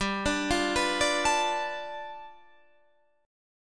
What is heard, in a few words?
G 9th, Guitar Half Step Down